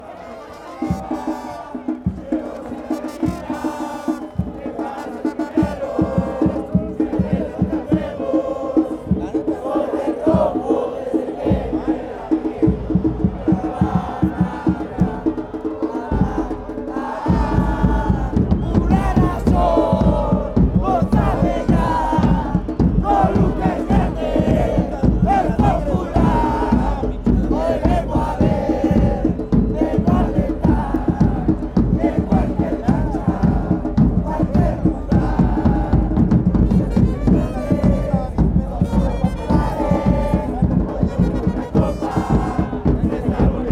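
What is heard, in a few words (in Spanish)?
soy del rojo con bateria, football chants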